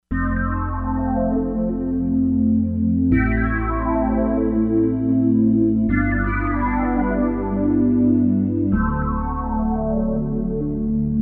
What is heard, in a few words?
Wave Sweep, Korg MSC-07S Synth